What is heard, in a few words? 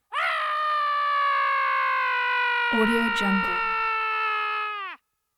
male screaming extended single scream